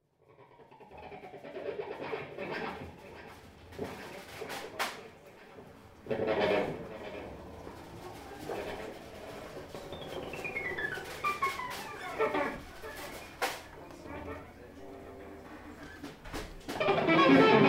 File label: Big Band